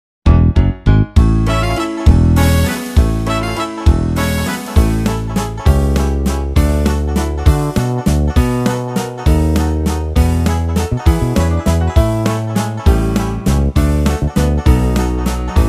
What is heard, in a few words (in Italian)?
Karaoke base musicale